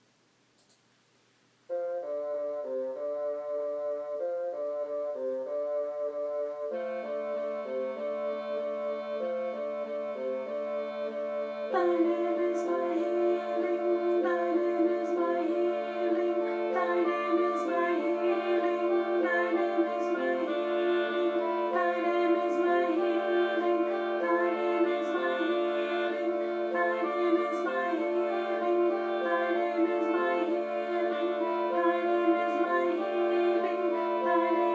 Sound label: thy name is my healing alto voice